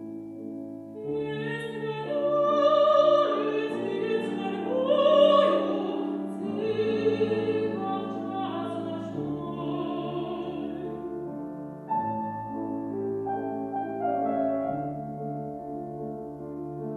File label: Serenade Voice